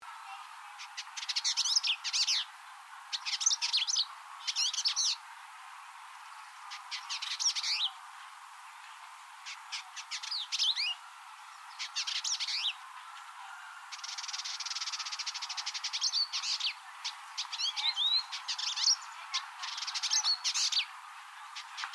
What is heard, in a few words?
Vidua chalybeata; Indigobird Song